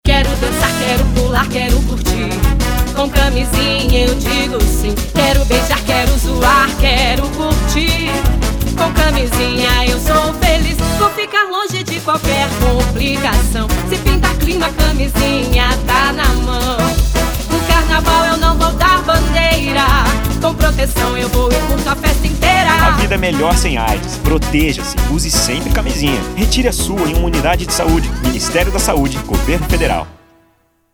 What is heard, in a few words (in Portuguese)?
JINGLE FREVO